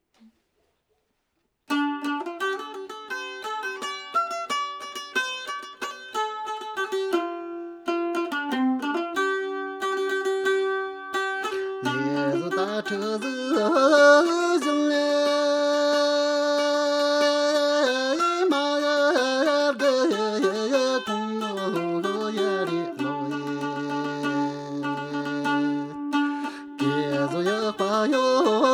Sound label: Dkyil 'khor Modern Folk Song